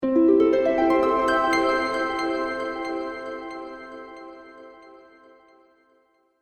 Epic Harp Spell, magic; Magic Sound Effect; Role Playing Game

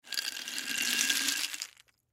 Jar Pour Pretzels Out Glass; Foley Jar Pour Pretzels Out Glass Food; Blastwave

